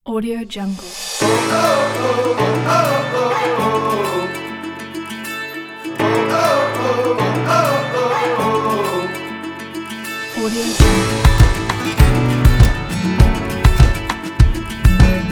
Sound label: indie folk